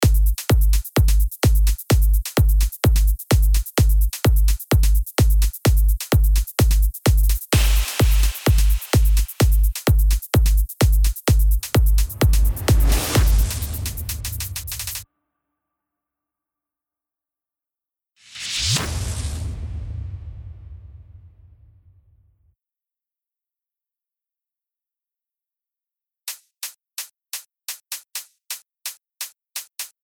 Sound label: Drums FX